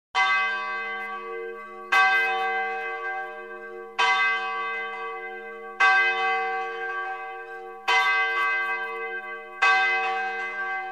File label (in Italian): Campane